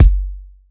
Kik; Rawcutz - Nu Forms

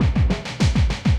loop, 200 BPM; Ueberschall; Drum & Bass Resonance

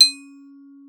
C#4, vibes; Xsample VOL-4

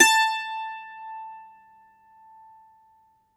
MANDOLIN, Plucked Strings

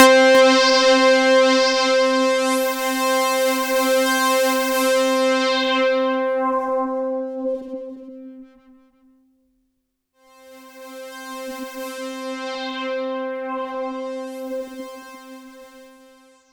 C4, SynthLine; 90 s Sample CDs; NiceBeats; SynthLine VOL-1

